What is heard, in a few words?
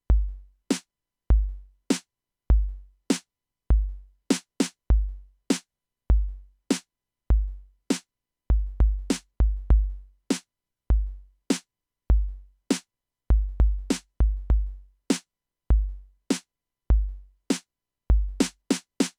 Slow drums